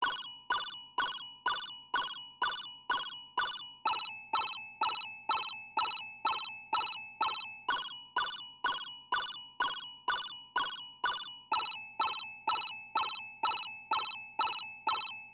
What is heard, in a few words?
anxiety short loop